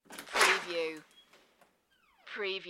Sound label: Door Garage Open